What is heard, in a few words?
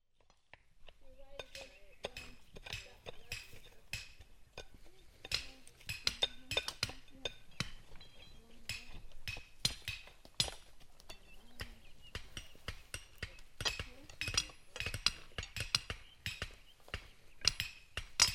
planting cottonwood